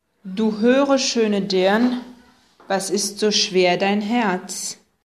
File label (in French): chorale